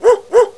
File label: dog; mtm2demo; PC Gamer 4.2